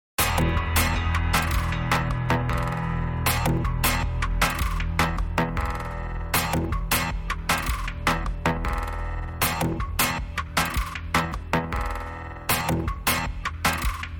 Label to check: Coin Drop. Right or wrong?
Wrong